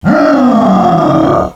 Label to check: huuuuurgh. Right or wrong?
Right